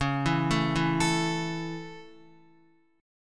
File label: Db Minor Sharp 5th Db down Guitar